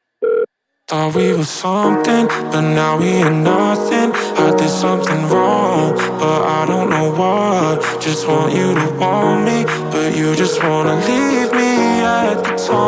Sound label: deep house